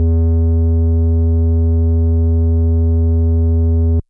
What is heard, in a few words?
FM Sq Dist DX100 E1; square